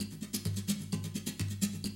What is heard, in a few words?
130, Classic DrumLoops